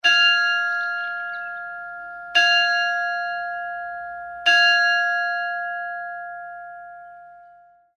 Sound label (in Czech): zvon; ludmila; zvonohra tony